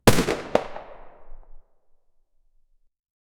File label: single sp5 9mm gunshot